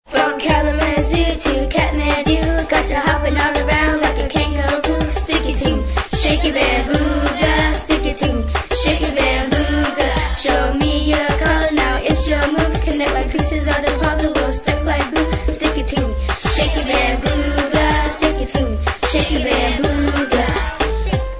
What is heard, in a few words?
Jingle